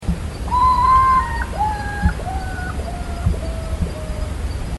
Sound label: nyctibius griseus